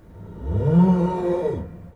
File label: String Rub, piano; Piano Miscellaneous